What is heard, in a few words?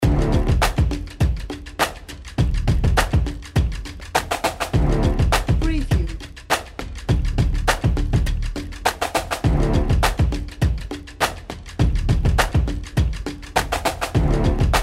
Happy Day Beat